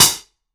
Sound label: Solid Bruiser F, Hats; Airwindows